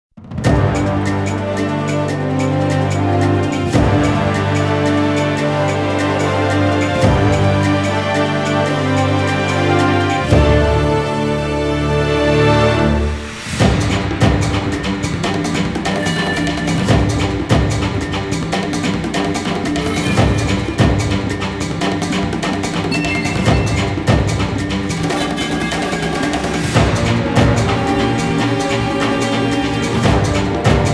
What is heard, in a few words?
Jungle Hunting Theme; Monster Hunter Frontier; G-Rank